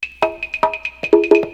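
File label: wood block; BEAT2000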